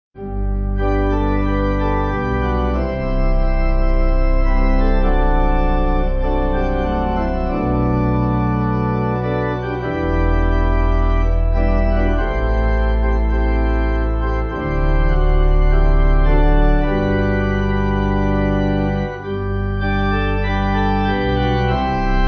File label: Pipe